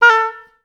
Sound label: OBS A3, Advanced Orchestra; Best Service